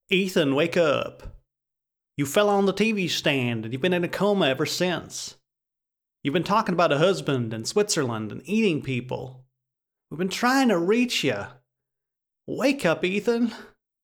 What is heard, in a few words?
barks